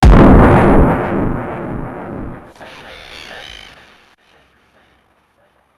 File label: explosions and delayed, chemical